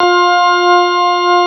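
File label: CX3 organ F4 L, Keyboards Old School; USB Soundscan vol.09; AKAI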